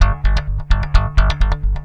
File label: Muffle Slap, Industrial; Nasty